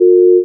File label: car horn; vehicles